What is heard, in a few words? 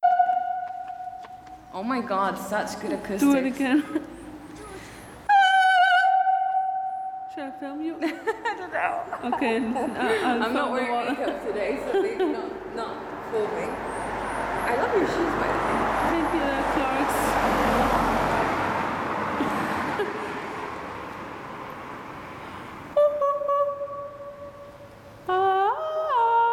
Tunnel Vox (Source Audio)